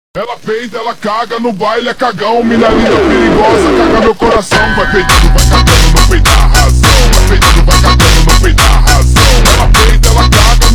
Tekk super Slowed; funk

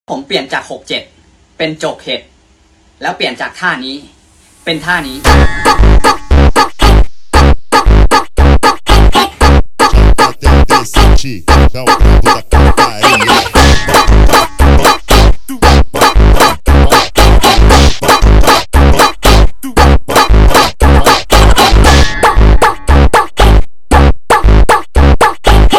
Funk slowed

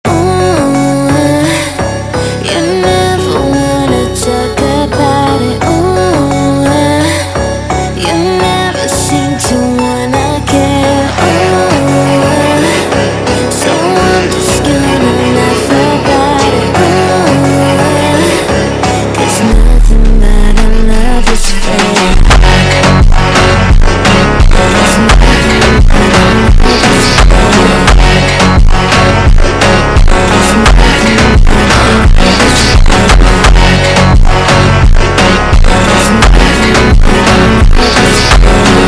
Funk ultra Slowed, funk

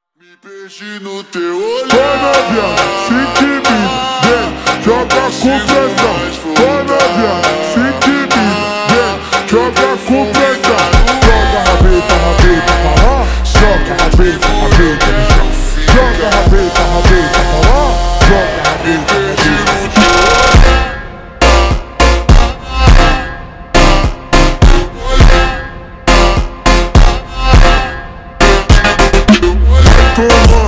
Funk super Slowed

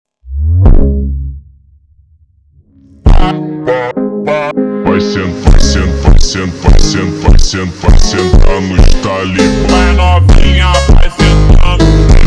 Montagem slowed

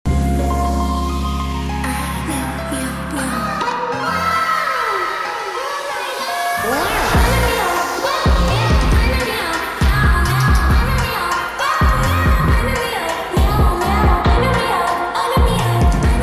8d Reverb, funk

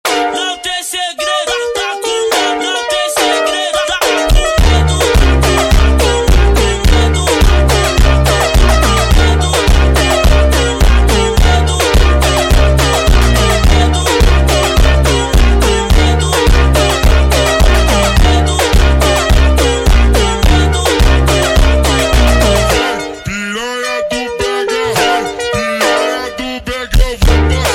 Montagem super Slowed, funk

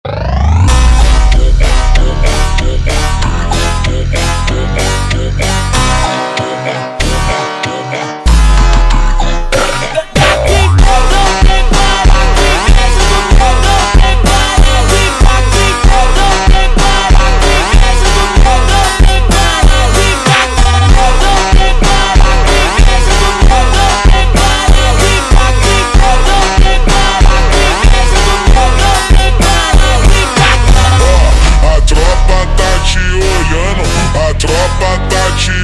Funk ultra Slowed, funk

